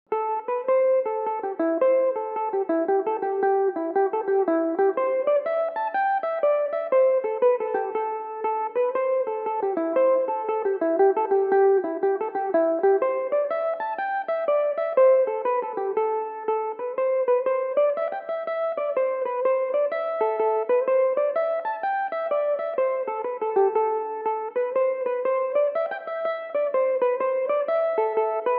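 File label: A dorian, irish mandolin, tunes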